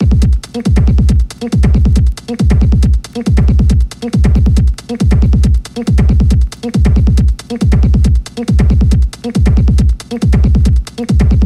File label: Locked Groove; Ultimate DJ Tools Vol 1